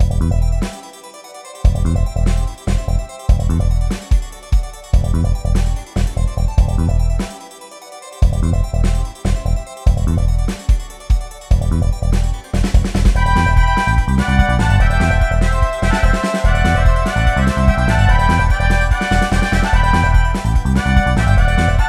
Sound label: Exploding Trumpet